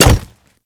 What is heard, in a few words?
Pet Step Default; Alad V